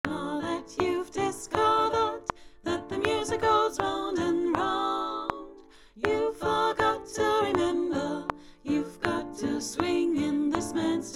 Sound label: sopran